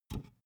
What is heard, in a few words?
big can filled pick up hard